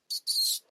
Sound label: mice grunt; minecraft; technic